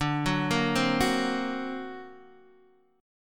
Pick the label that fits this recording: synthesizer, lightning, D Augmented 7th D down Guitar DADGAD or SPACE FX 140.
D Augmented 7th D down Guitar DADGAD